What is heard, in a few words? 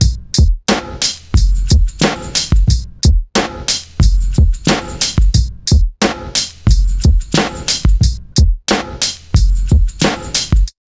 90 BEAT, Pure Electro; USB Soundscan vol.03; AKAI